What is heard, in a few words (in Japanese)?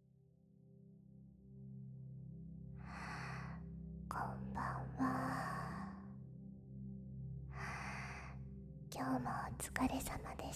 超睡眠BGM; 認知シャッフル睡眠法